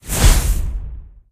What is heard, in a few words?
lightning skill hit; Minecraft; Votive Content